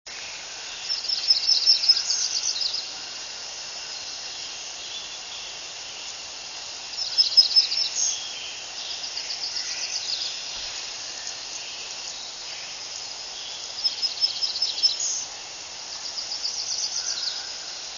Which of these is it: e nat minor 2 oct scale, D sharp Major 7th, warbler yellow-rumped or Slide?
warbler yellow-rumped